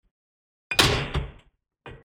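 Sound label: metallic mats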